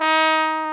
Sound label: BRS BONE, Solo T.Bone; Roland L-CD702; Solo Bone 3